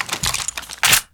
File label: f2000 clip out; garrysmod